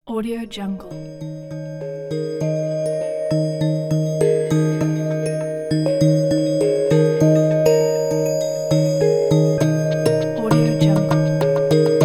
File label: soundscape percussion fantasy